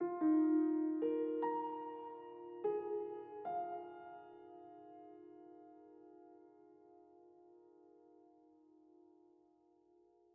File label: Env Field Night Ground